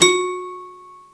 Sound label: Toy Piano